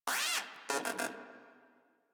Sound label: Moa Aggressive Vocal Player Down; Warframe